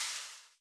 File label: Laser; SoundBank LaserCommander